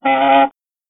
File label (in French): bruit; MOBICLUB